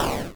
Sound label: explosion; deep worm